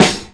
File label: dry snare sample f sharp key